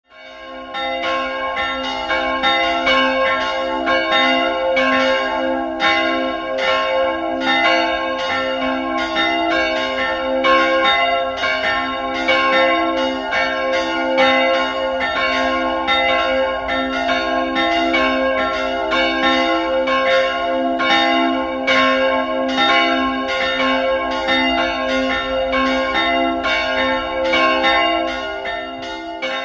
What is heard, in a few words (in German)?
Pfraundorf, Glocken